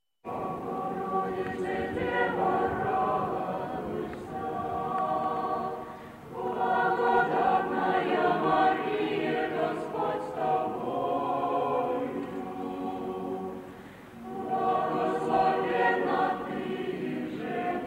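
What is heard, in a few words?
choir; Bogorodice Devo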